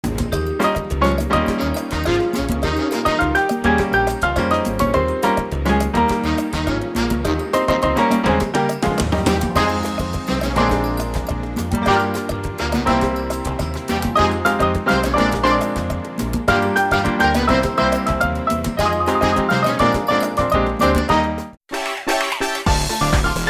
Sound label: Music Latin